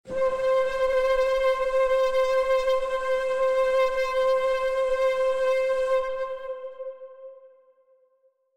Angry C4; Harmony Hermit

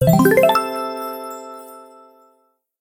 Experiment Success

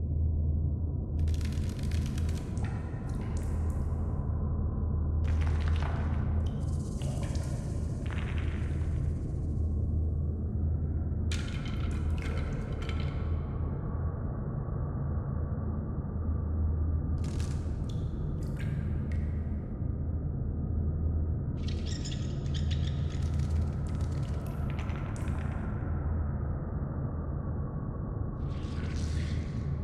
Ambience Cave

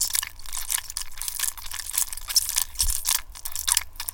Flesh Move fast

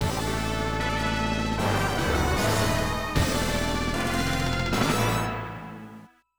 Gladiator Fanfare; Asterix; Ave Caesar

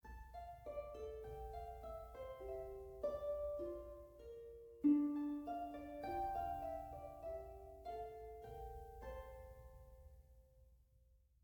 harp; Quivr; PS4 Windows gamerip 2016

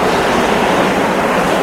Speed Mouvement high

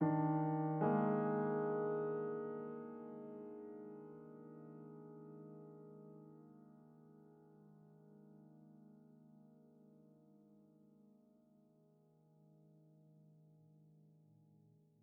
Env Field Night Ground; the legend of zelda tears of the kingdom

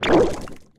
Jello destroy; Super Mombo Quest

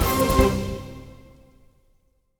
Music Mess Theme Bpm160 End; asterix obelix slap them all 2

